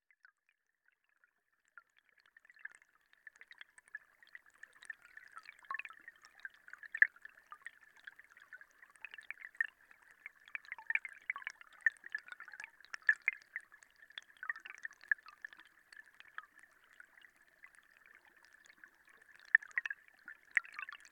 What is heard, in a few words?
Hydrophone in stream near Mills Of Kintreath, North Berwick